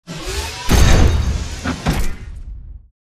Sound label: melee stomp; Titanfall 2